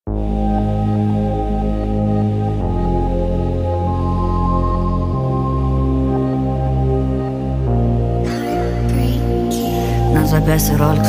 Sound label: speed up